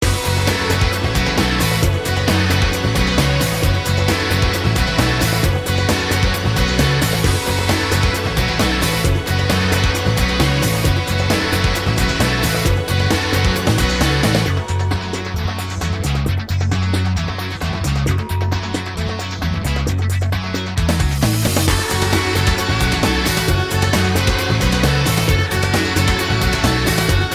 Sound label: battle normal boss; beasts